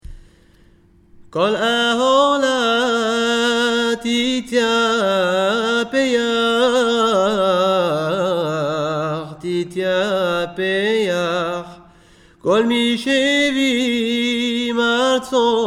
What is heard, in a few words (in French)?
chant